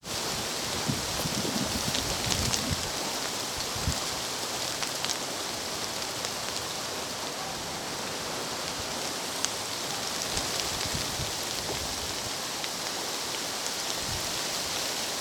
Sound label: Humming Alder Tree